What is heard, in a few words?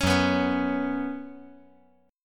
Gb Suspended 4th Gb, down